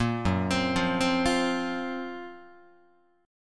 Gb Major 7th Flat 5th Guitar; GbM7b5 arpeggiated; JGuitar

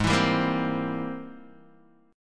G sharp Augmented Major 9th G sharp Guitar DADGAD

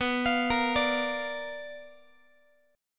B Minor Major 7th Flat 5th Guitar; arpeggiated; JGuitar

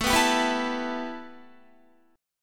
A 7th Suspended 2nd Guitar; A7sus2; strummed

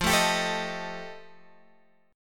F 9th F Guitar Open G, chord

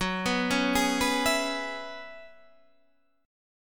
F sharp 9th Suspended 4th F sharp, chord

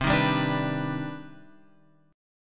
C Minor Major 9th, chord; CmM9 Chord {8 6 5 7 X 7} strummed

